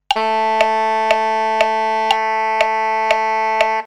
dziura gb1, saksofon

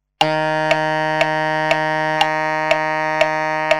dziura c1, saksofon

